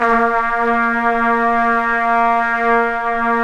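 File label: trumpets swell; Roland LCDP06 Brass Sections; Tpts mp)f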